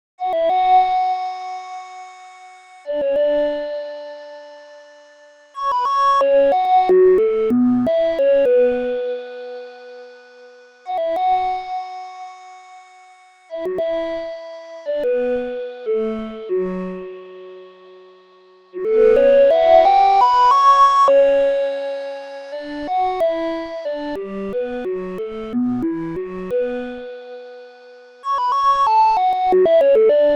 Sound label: 90 Synth